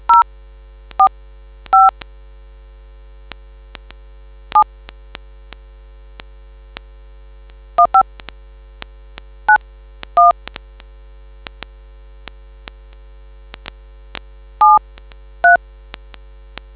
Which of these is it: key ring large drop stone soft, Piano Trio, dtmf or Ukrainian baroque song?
dtmf